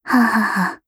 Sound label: laugh; Eternal Return; Katja; KOR